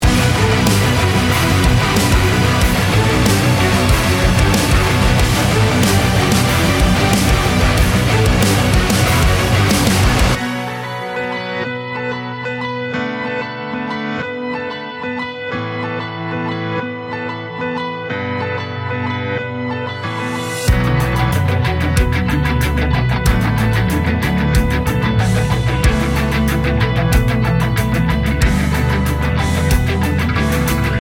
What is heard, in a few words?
Instrumental